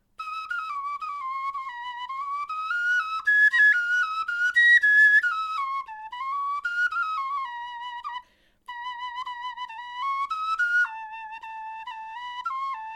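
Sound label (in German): melodie, Knochenflöten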